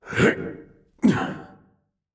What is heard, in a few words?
Warrior Grunt Jump; Realm Royale; Archangel